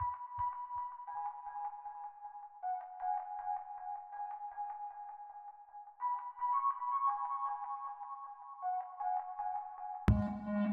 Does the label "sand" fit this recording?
No